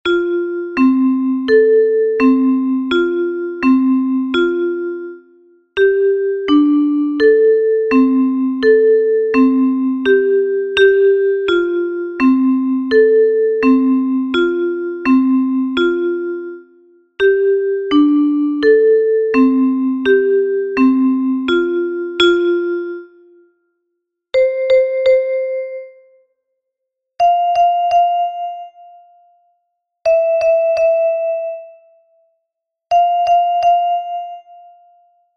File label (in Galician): Metalofono Contralto; Bo Nadal